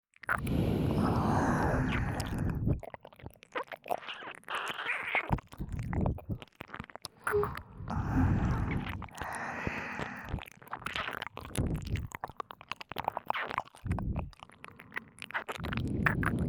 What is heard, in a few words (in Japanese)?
やさしめ両耳舐めループ